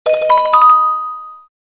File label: Simple Bell; Samsung X01SC Omnia PRO